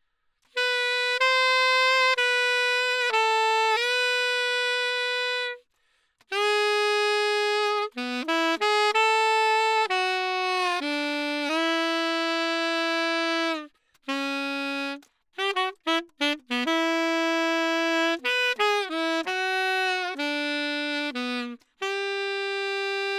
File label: Sax; Gefell